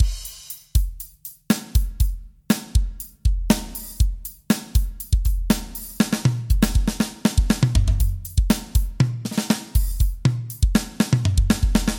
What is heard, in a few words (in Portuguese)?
Sertanejo